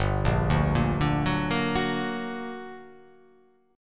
G Minor Major 7th G; chordshape; down

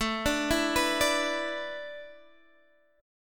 A Suspended 2nd Suspended 4th, Guitar, Standard; Asus2sus4; arpeggiated; x,12,12,9,12,10; JGuitar

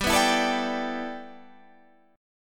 G 6th Add 9th G Guitar Drop D, chord

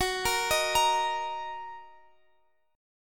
F sharp 6th F sharp down Guitar Drop D

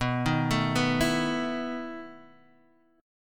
E Major 7th Suspended 4th, B

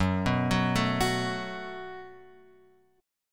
F sharp Minor Double Flat 5th F sharp; F#mbb5 Chord {2 2 4 2 X 2} arpeggiated

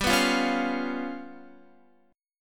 G 7th Flat 5th G, Guitar; JGuitar; strummed

